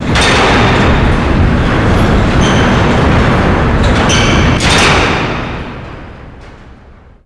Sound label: door hanger metal open; Doors; Call of Duty Modern Warfare